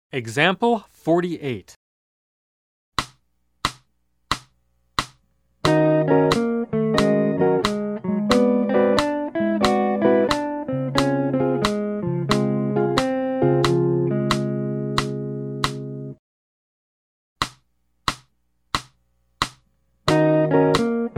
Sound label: Jazz